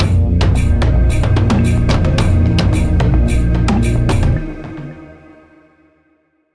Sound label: basicMood Cueva Bosque